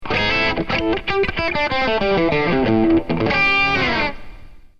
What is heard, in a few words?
a pent scale guitar